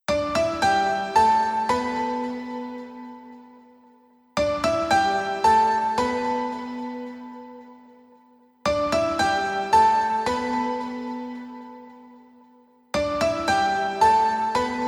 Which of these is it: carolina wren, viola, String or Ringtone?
Ringtone